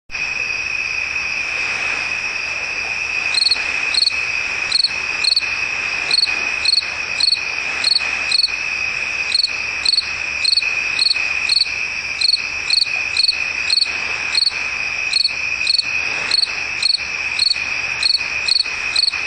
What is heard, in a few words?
cricket waves; the lake water gently sloshes against the wooded shoreline; song of crickets as the lake water gently sloshes against the wooded shoreline; More crickets